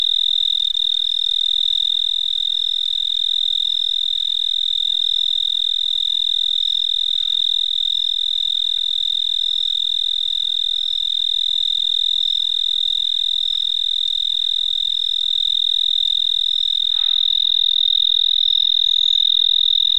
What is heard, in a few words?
four-spotted tree cricket; Insects